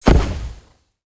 smoke grenade

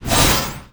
mirror charge

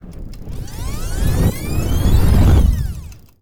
time travel

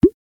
bubble cartoon

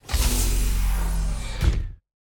glass door close

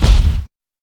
magic

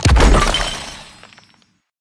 treasure drop

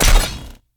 snake knife

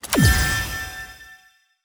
resource buff

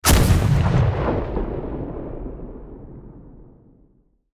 ship cannon

